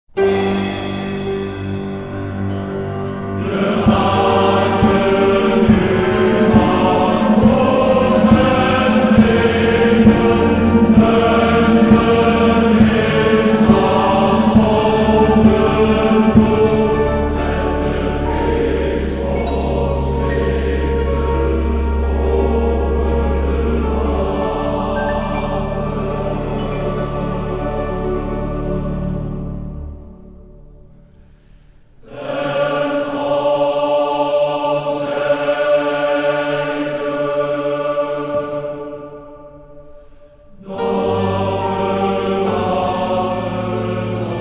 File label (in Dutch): TTBB